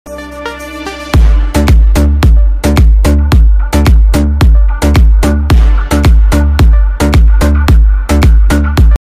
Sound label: azeri bass music; haminin axtardigi mahni polnu bass xod ver